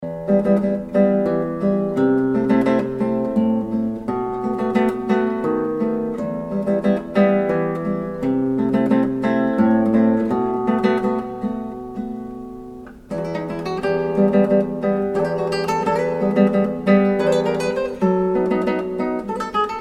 Spanish Bolero, guitar

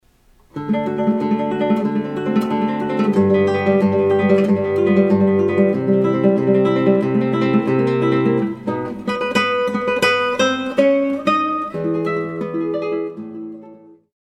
Preludes Allegro, guitar

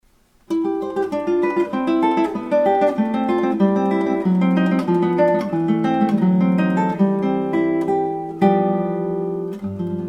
Gavotte, guitar

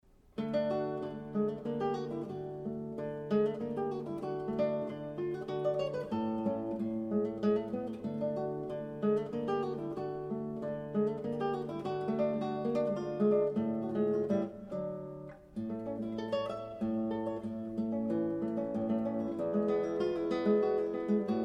Polonaise, guitar; Zwei Polonaisen Und Mazurka Opus 3; Mertz

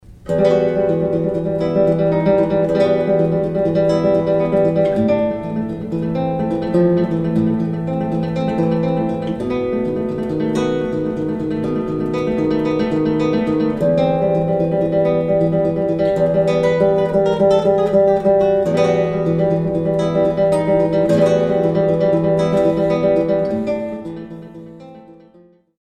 Il Canto Ben Marcat, guitar; Rigoletto Opern Revue No.21

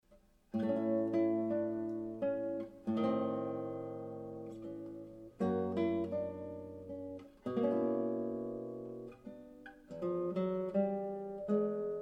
Zwei Polonaisen Und Mazurka, guitar; Mazurka2; Mertz

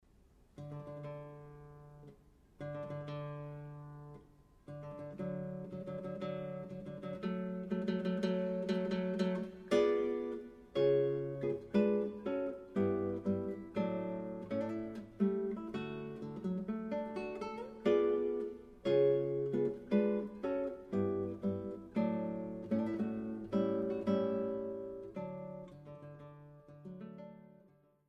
Hochzeitsmarsch wedding march, guitar

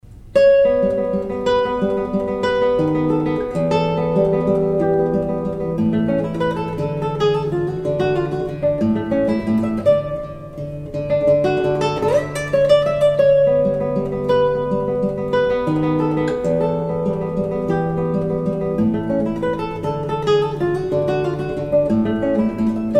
Marcato La Melodia, guitar; Lob Der Thranen Op.22 No.2

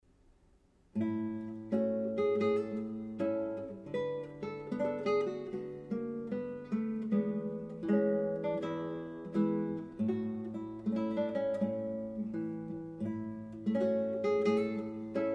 Andante, guitar; Straniera Opera Revue No. 10; Mertz

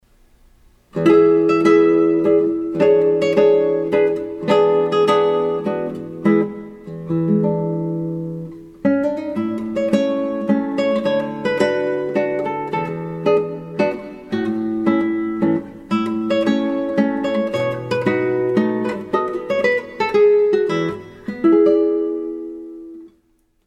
Mazurka

